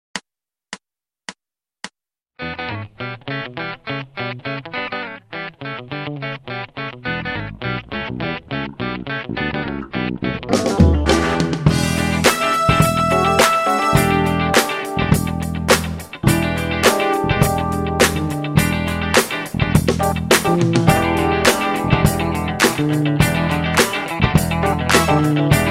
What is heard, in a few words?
rnb